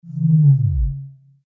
deep movement

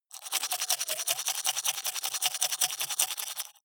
teeth brush dry open inward fast

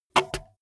bmx bike tire foot scuff short heavy

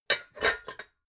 pots put down

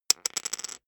poker chip fall wobble

